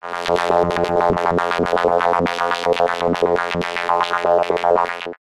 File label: science fiction robot chatter mumble limited range